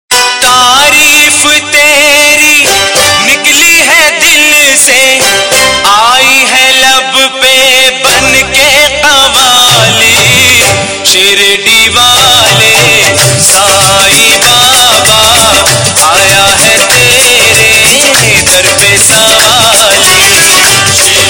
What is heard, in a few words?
Gujarati Mix; Gujarati Ringtones